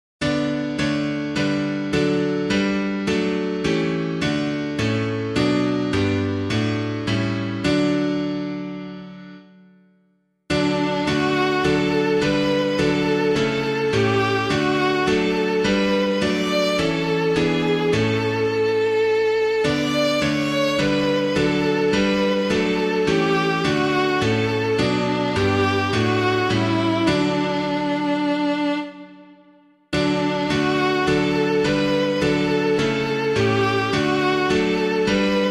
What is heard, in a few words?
piano